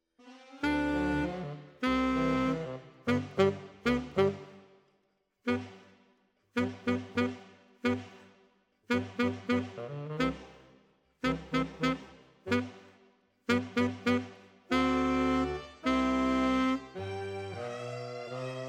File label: Alto, RNR for piano